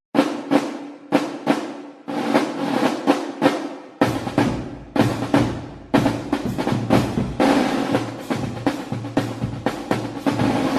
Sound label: reggae drumming